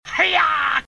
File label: heyaaaah; zelda oot